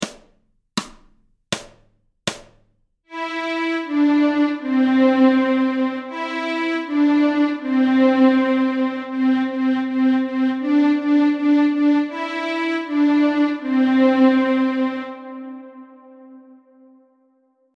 Stråk C, Fiol; Köp varm korv; Notträning

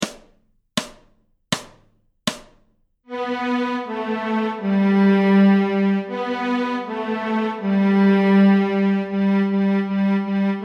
Stråk G, Fiol; Köp varm korv; Notträning

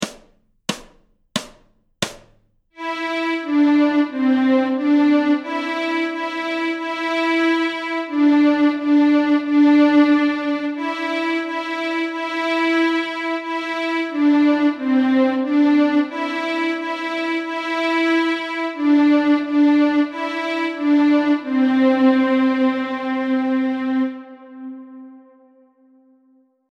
Stråk C, Fiol; Notträning

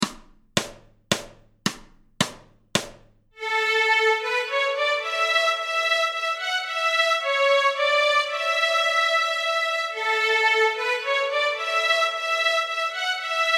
Mora långdans Stråk A, Fiol; Notträning

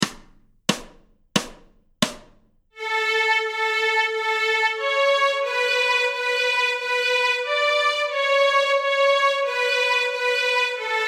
Stråk A, Fiol; Björnen sover; Notträning

